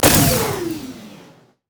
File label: rumble overheat